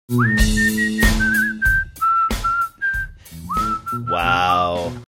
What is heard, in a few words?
whistling young folks saying wow